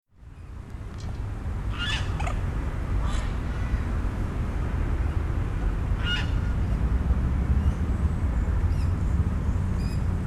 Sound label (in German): wasservoegel